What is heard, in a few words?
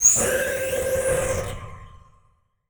Title Transition Communication Distortion; Production Element Title Transition